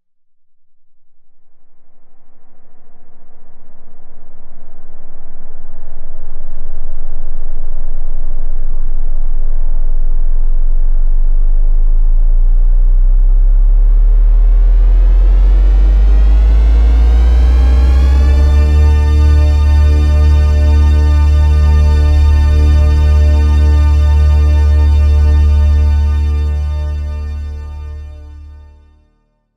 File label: THX Sound Effect (Warning Huge Bass); THX Sound Effect Remastered HD